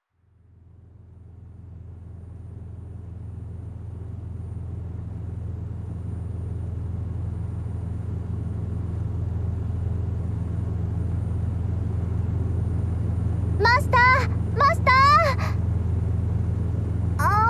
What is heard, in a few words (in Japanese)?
モータボート走行音。テント設営ASMR。無人島環境音; 「モーターボートとテント設営と右耳耳かき」